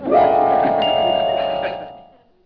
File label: woosh